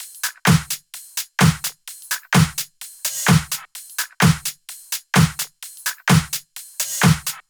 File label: Loops 128BPM, Drumloops